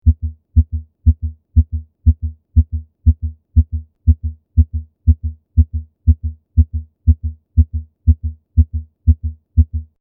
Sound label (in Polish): Tachykardia miarowa 120 min